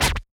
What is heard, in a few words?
SCR, Yamaha DJX 2B